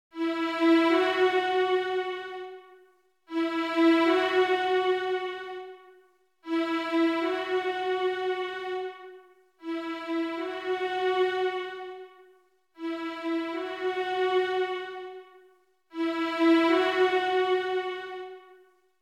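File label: Old Friends Bartok Violin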